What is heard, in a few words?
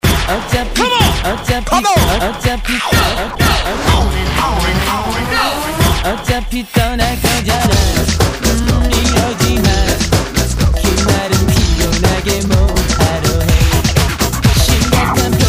Techno Remix